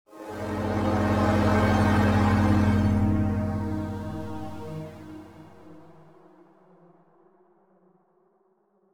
Electric wave; World to the West; PS4

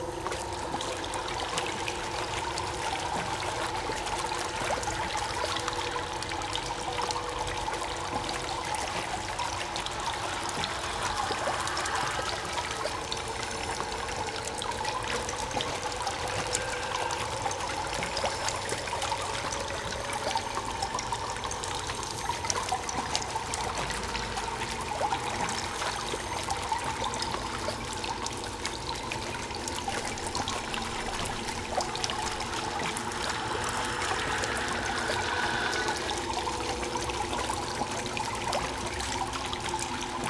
Tomb Ambience; a dump in the dark

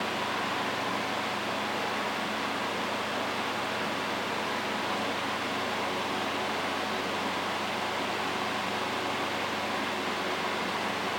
fan Medium

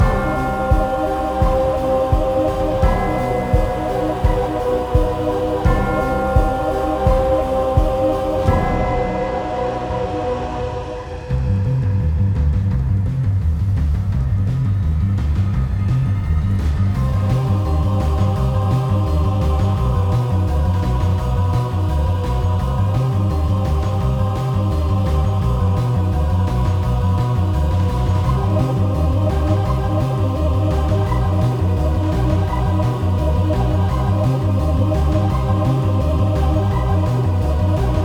Boss Music; primal light linux macos switch windows xbox one xbox series xs gamerip 2020

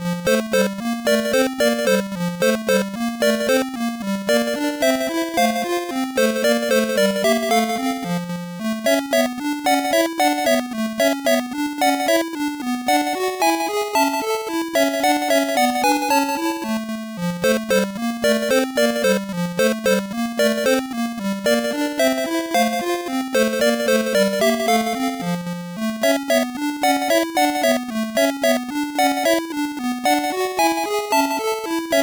Minigame BGM; kikansha thomas ni notte pico gamerip 1995

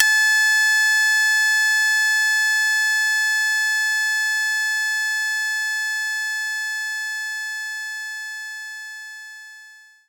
Shamisen B3

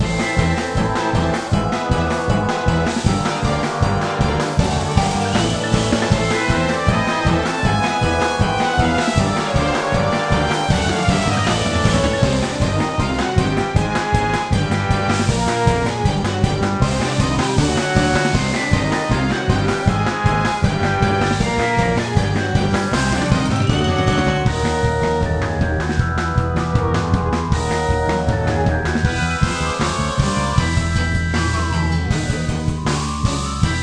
Battle Loop; Tower

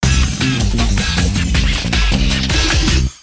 All Clear; beta bloc; psp

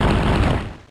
Crunch

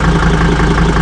trucks idle; Dad 'n Me

